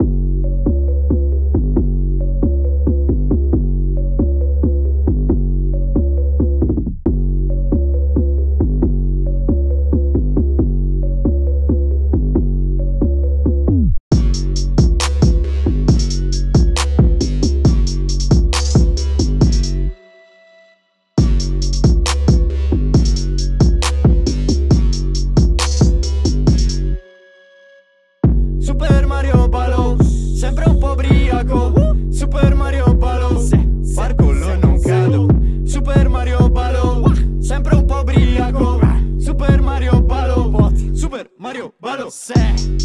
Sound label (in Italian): strumentale